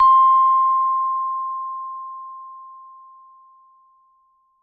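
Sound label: vibrating sound of note F 4 octave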